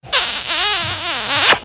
kiss message tone

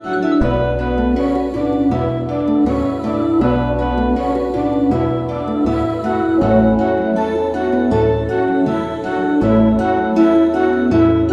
cliff ringtone

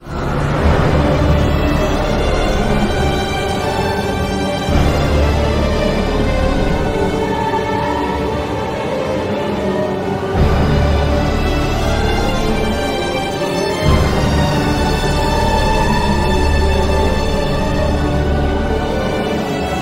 ringtone; diablo immortal

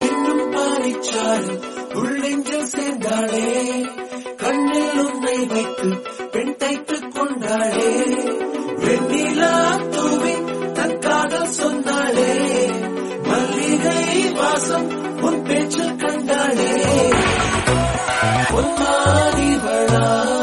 Tamil Song; Pachaikili Muthucharam

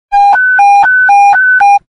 police panic button sound

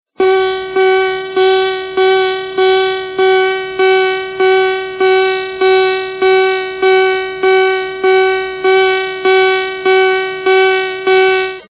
general quarters alarm

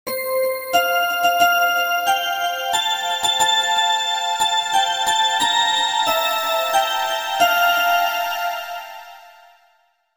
o christmas tree melody

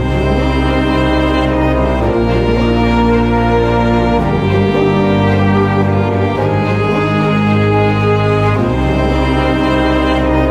victory ringtone

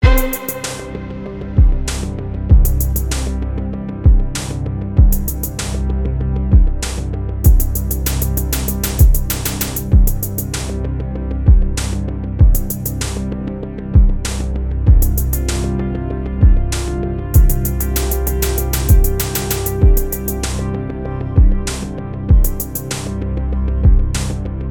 heavenly music ringtone

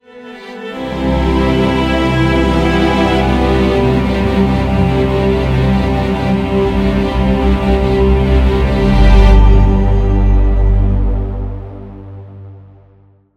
detective ringtone

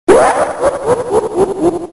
laugh sound effect; sonic exe

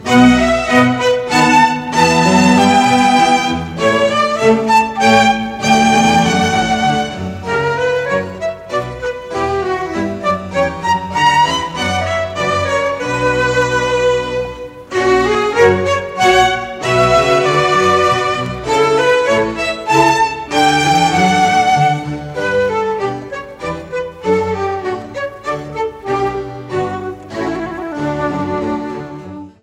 orchestral suite no 2 in b minor

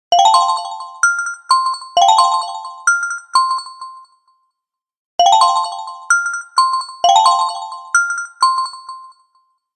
xylophone time ringtone

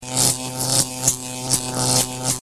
electric sound effects

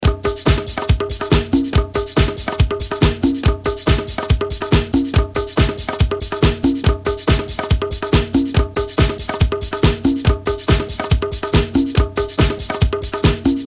Latin ringtone

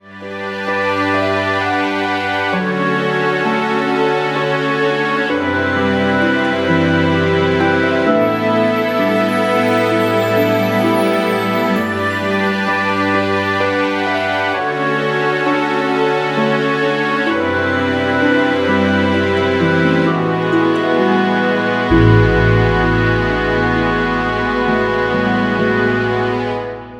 spring music

